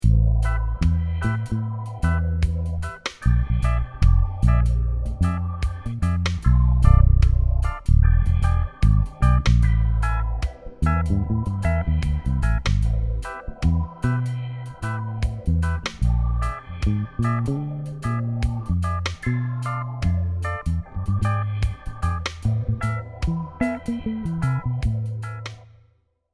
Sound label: Reggae; Fclef 5 string Vintage ‘60